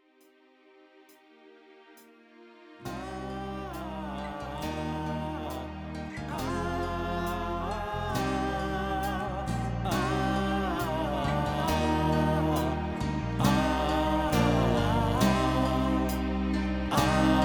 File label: Rain All Voc